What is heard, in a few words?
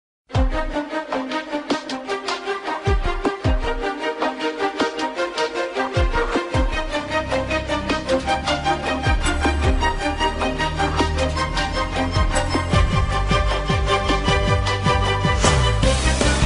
dramatic game music; minute to win it